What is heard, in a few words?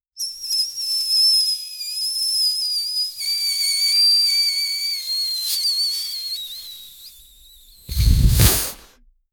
a balloon being squeezed; air